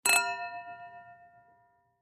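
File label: 2 Large Crystal Wine Glasses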